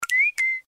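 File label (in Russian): periskop twit, periscope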